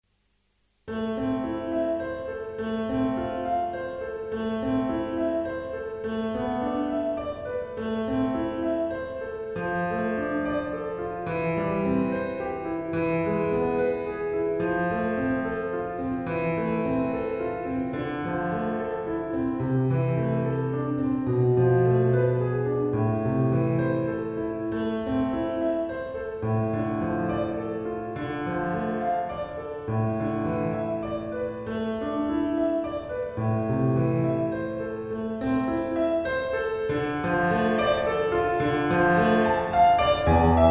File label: piano pop